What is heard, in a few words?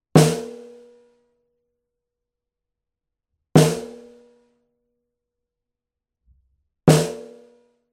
Snare - C414 Bi Direct - Edge modelled; bidirectional (osmička); edge duo srovnavaci test